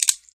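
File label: weapon switch; FPS Game; ML-Agents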